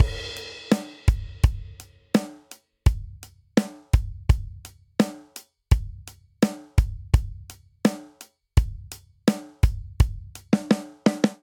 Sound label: Straight Eighths Rock Verse 84 bpm; MIDI Clips; Ableton; Factory Packs; Drum Booth